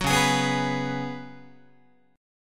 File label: Db 7th Suspended 4th Db down Guitar; strummed